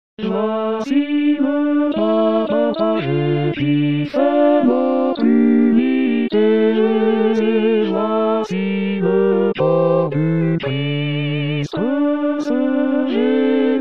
Voici le pain partage, Chorale

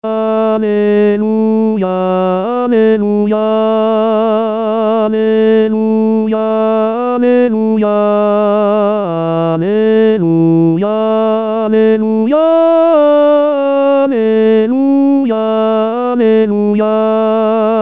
Alleluia Festif, Chorale; 2016 Paques

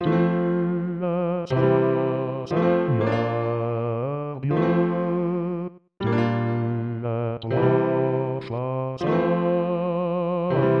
Basse Accords

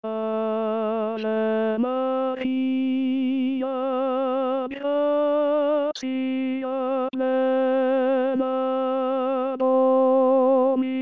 Ave Maria tenor, chorale

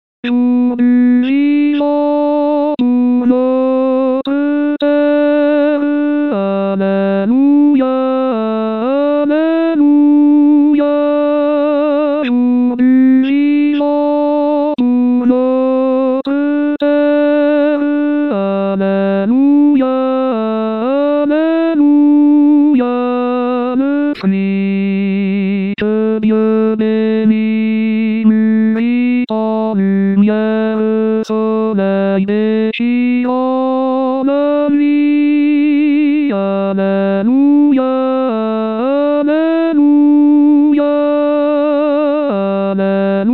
Jour du Vivant Tenor

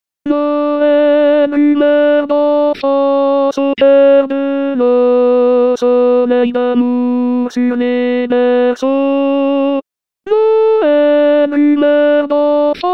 Alto, Chorale; Noel

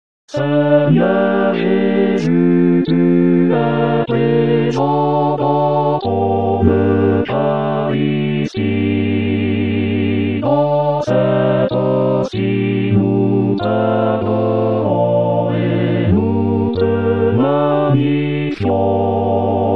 Seigneur Jesus, Chorale

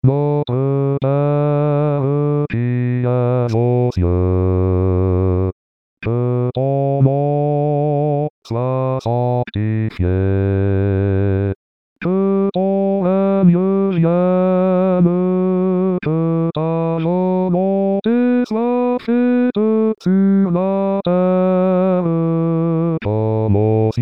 Notre Pere Basse, Chorale; Pentecote

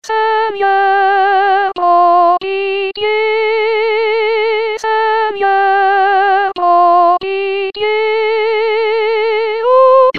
Kyrie Messe Réconciliation

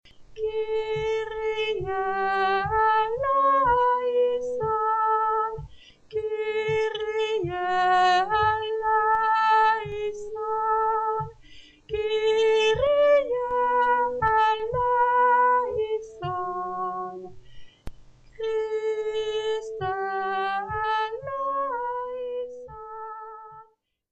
Kyrie Tenor

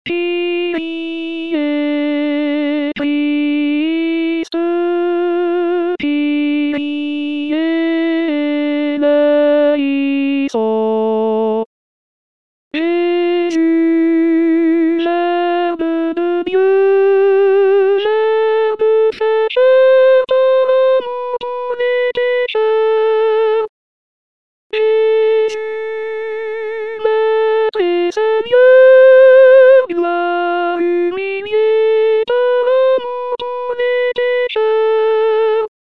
Jesus Verbe de Dieu Alto

